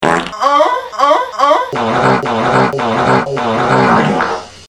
fart moan extended

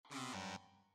erro quiz; banjo e kazooie

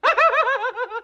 laughing; amadeus

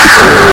child scare; trtf 5

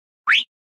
whistle parrot

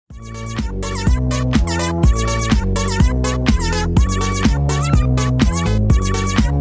funky song

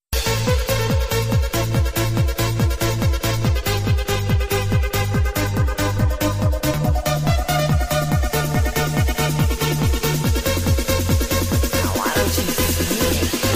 trance tune

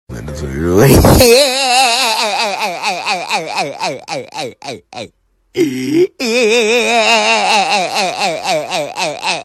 weird laugh

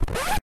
cartoon record scratch

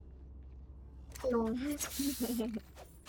ahahahahhahahahahha

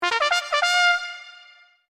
trumpet fanfare; peter pans

